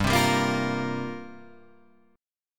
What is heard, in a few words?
C 7th Suspended 4th G